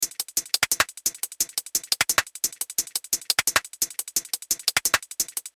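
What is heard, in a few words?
174 hat loop, Drum & Bass; Tech Flavours; Drum & Bass Sample Pack inspired by the sub-genre of Tech Step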